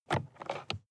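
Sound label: sports car interior compartment open; verkehr transport; autos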